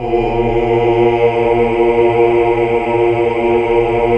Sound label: HAL AAH, GREG. AAAH Uni; Sound & Vision - Gigapack II CD 1 (Roland)